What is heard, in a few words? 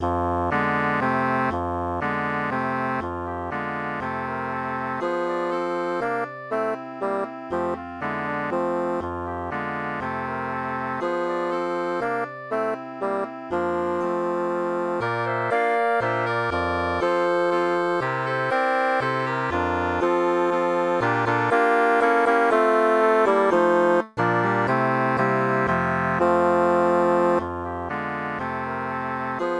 Zither Carol